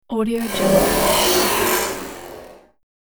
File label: robot core user sound effect